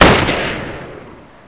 sniper; axis; WAR

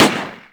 m1 garand; WAR

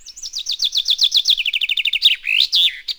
chaffin; WAR

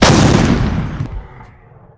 20mm fire; WAR

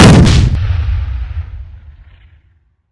75mm gun; WAR

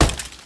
building hit; WAR

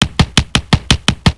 flak popper loop; WAR

